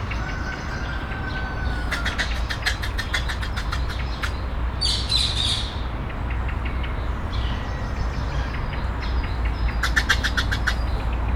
megmutatja magát, nílusi lúd; kecskemeti zoo 2016

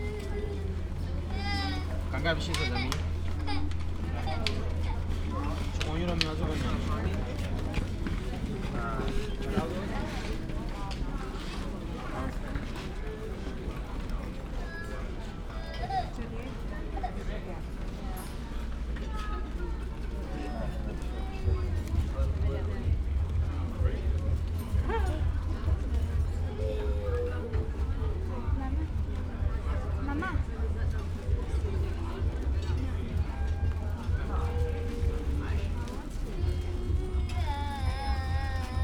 sirdogalo kiskolyok velence; olaszorszag

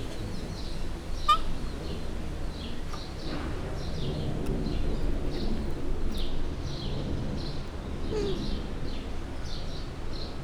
kedves hangocskák párbeszéd sztereóban, gyűrűsfarkú maki; gyori zoo 2014

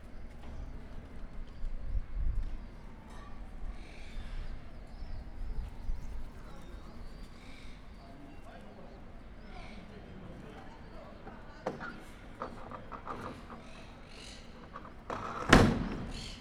templomocska az utca végében; lejart pap munkaideje

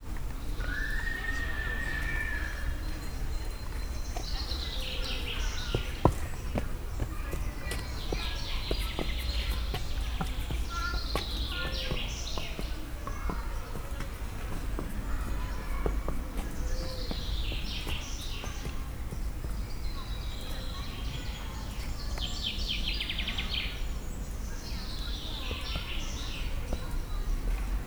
rovarok által űzött szamár; szegedi zoo 2011

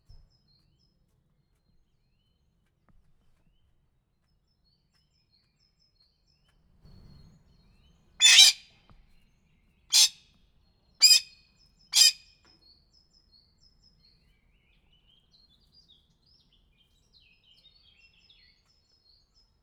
rikolto madar, aranyfacan; felsolajos zoo 2015; cardioid

